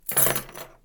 putting keys

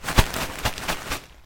shaking a crisp bag

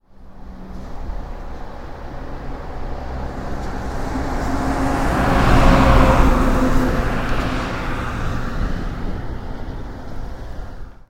cargo truck pass

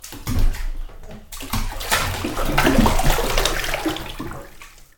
into a bath

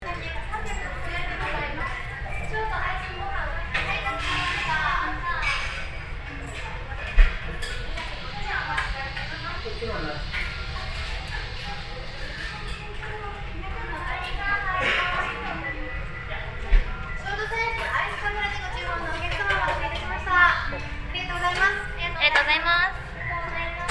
cafe girls

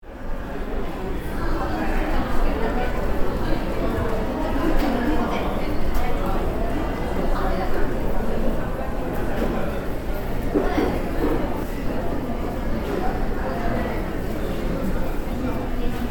hubbub at a cafe

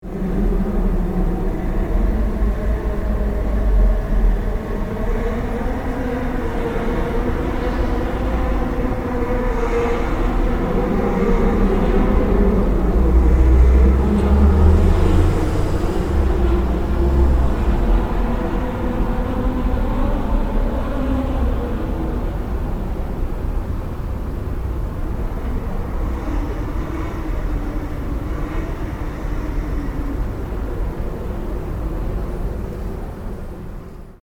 bikes on night streets

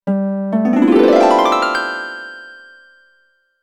blackout harp; anime

